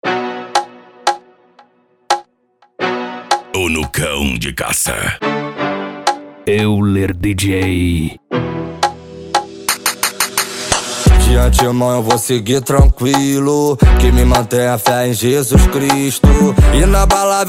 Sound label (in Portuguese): funk ritmado